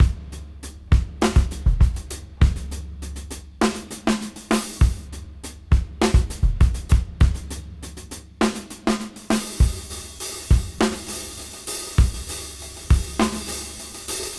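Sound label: Half Funk 101 BPM Reverse; AMG; Keith LeBlanc Phat Butt Jams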